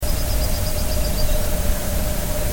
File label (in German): Goldammer